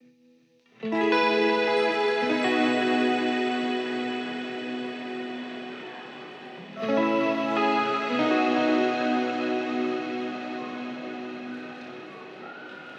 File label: ambient